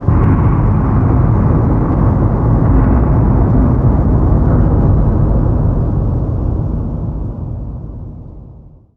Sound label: thunder, halloween ambient; Garry's Mod; tf2